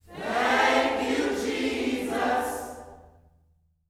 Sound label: THANK U, Gospel; Spectrasonics; GOSP PHR